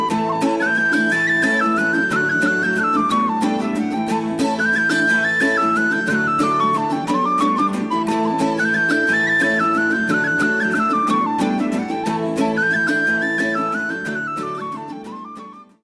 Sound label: Whistlejigs